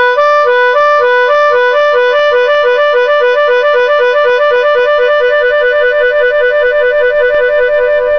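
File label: trill, harmonica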